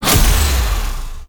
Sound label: Venus Hoverboard Race Gate Hit B; Warframe; Vehicles; Hoverboard Racing